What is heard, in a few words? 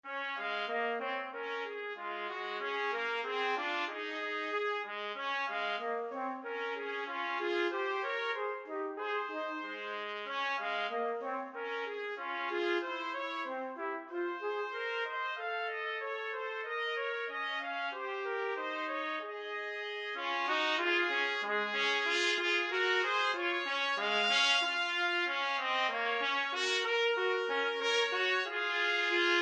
2 trumpet